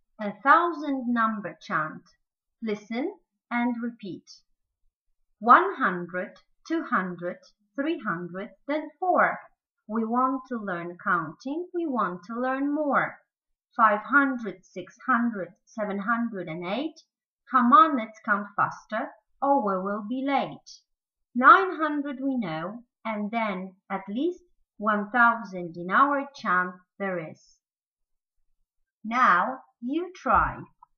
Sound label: A thousand number chant